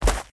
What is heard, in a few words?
footsteps; smite; god merlin